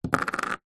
money coin set down on cardboard box; zapsplat

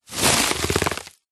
foley plastic bag thin empty blow up inflate

